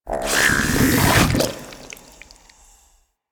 Infested Drop Pod Retract; Warframe

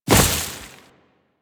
Step Veg, footsteps; Warframe; Grineer

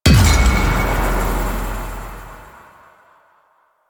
Hit React Quick

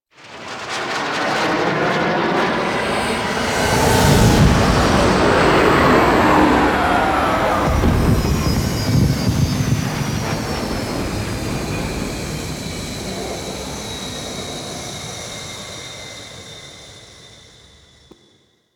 Relay Intro Docking, Vehicles; Zariman Liset; Flight

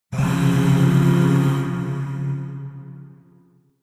Shawzin Phrygian Chord D; Music; Chords; Warframe; Zariman Void Shawzin; DE

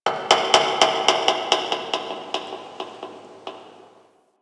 Metal Creak; Ambience; One Offs; Warframe; Quests; The New War; Drifter Camp

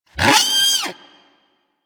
Snow Critter Vocal Pain

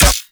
Mech C; Warframe; Weapons; Infested; Inf Cernos; Prime Derelict Cernos

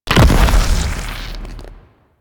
Pit Monster Projectile Explosion; Warframe; Infested

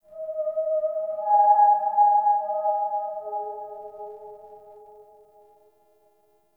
Texture Interior Small; Sentient Lair; Ambience; Warframe

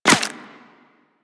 Bullet Impact Flesh; Warframe; Weapons

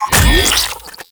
Fluid Sac Grab Sac; Warframe; Infested Eris; Encounters

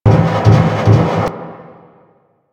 Venus Landscape Pump Station Loop; Ambience; Props; Warframe; Venus Open World

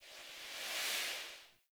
Player Jump Heavy Attack Start Wind; Falling Wind

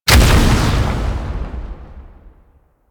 Mimic Explode; Warframe; Nineteeen Ninety Nine; PvPvE

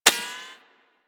Bullet Impact Ricochet; Warframe; Weapons

